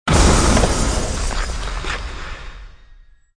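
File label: Smashing Crash; hockey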